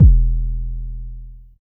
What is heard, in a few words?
808 E1, Kicks; Drums; MBase 11 - 808